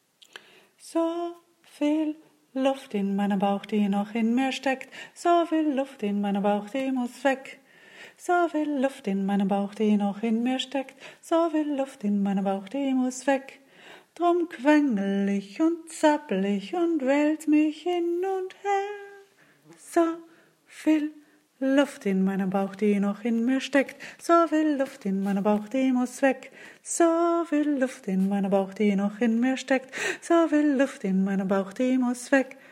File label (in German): kind liedchen hochdeutsch